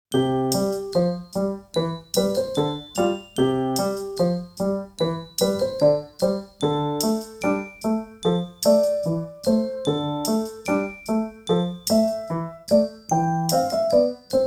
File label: flute, girls